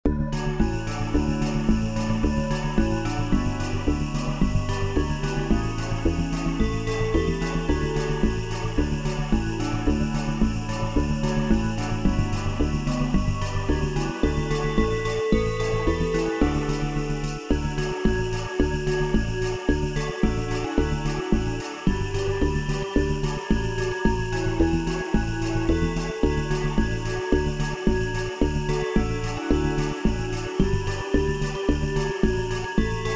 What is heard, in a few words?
jingle bells